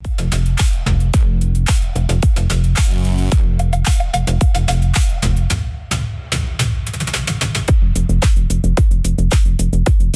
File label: loop; bgm